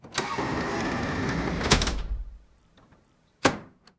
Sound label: a mechanical clunk as mag, doors